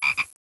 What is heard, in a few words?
FROG